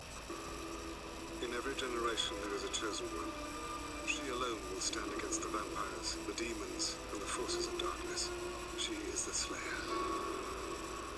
Alert Tone

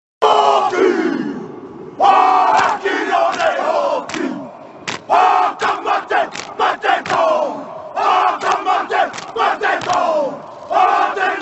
Haka Rugby

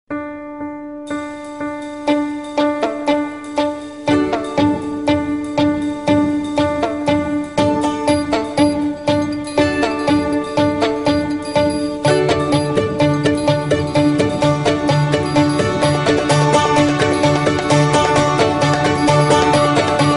Msg New; Chrome

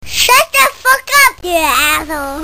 Cute Baby

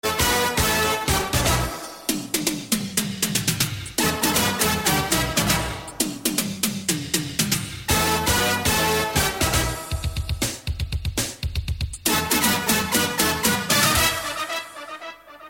Trance With Piano

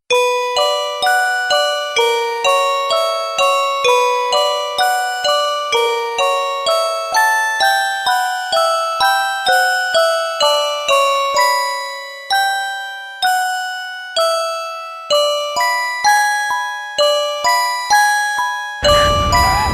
The Phone Tone

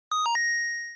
8-bit; Kawaii

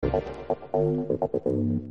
Techno bong

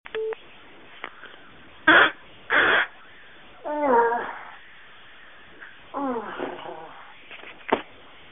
Wet fart

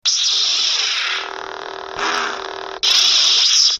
Lightsaber; Anakin

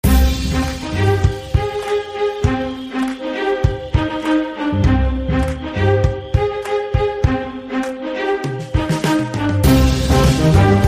Urban beats

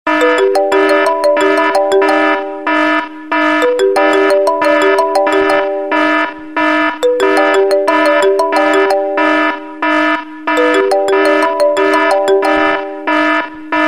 Siren Tone; Iphone

